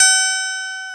GTR STRAT, Clean E.Gtr, Brite Strat; Roland L-CD701; Clean E.Gtr5; Brite Strat2

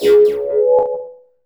Computer Active, sci-fi

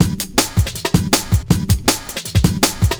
Beat, Drum N Bass; Beats; Sonic Foundry (Sony Creative Software); Knutrix Drum & Bass

